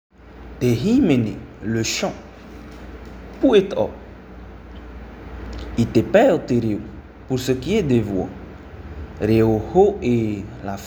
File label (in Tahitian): Te himene